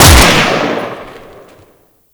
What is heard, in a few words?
Grineer Lever Action Rifle Shot; Weapons